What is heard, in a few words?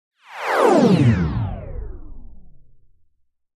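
Descend Electronic Whoosh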